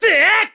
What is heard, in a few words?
Sword Spin; Link's sword-spin shouts; Skyward Sword; zelda